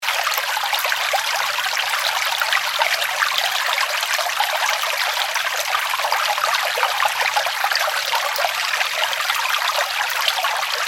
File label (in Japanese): 排水路の流水音